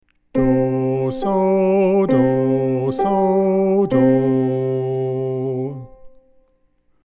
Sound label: do soh do